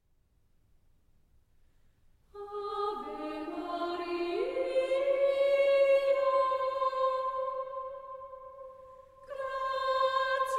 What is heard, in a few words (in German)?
Kammerchor Ave Maria